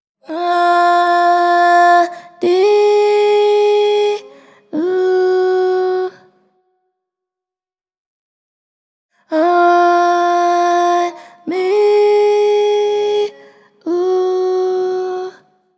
Body harm high Bb min

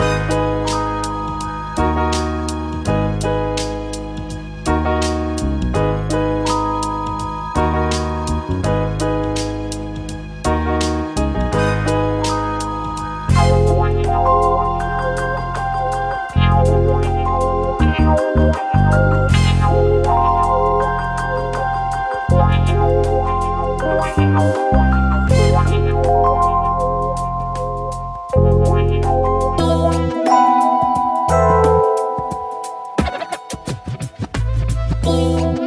Vintage Breath; Vintage soul and R&B; VIntage Breath Vol 2; ric beats

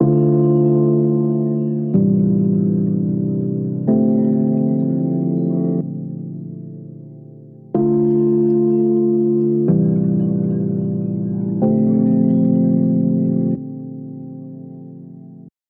Piano 124bpm Dmin

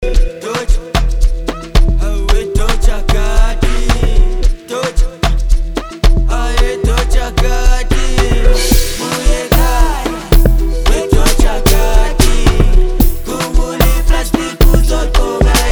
AMAPIANO; BILLBOARD All In One

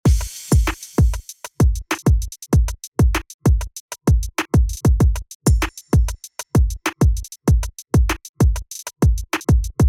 affinity loop

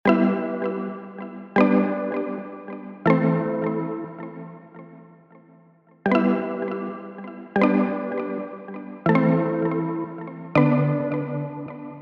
Tropicana loop

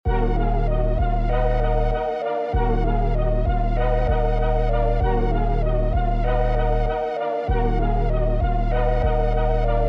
Late night loop

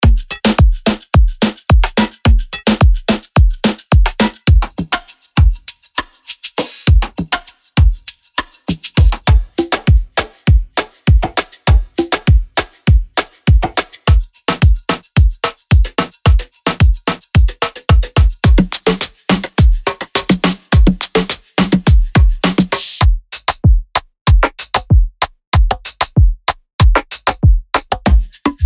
Afrobeats Drum Kit; Aetheric Samples; Kryptic

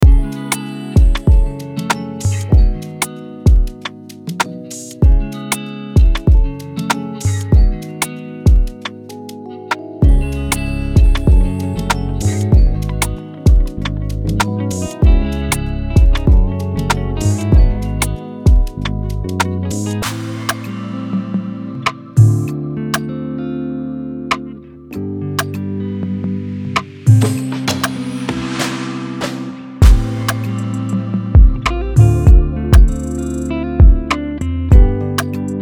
Kwa Zulu, african drum; African Drum Bundle Pack; Kitie 150

